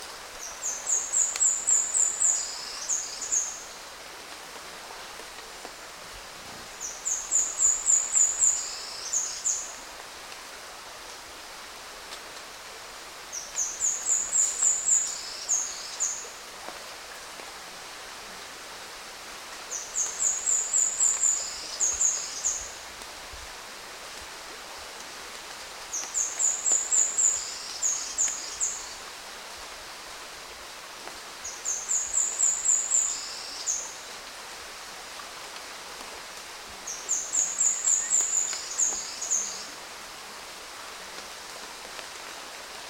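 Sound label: Speckled spinetail, bird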